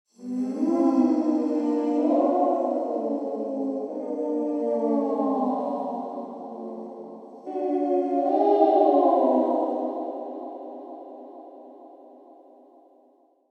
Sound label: Spooky ghost wail